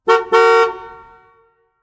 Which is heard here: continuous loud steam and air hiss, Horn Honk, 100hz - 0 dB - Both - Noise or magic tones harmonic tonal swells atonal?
Horn Honk